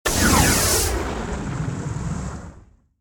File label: Air Blast; DJ sounds